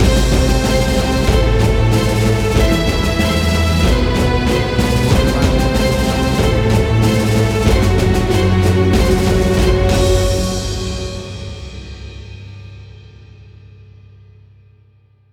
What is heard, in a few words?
Big Action Trailer Music; Huge music for an action trailer.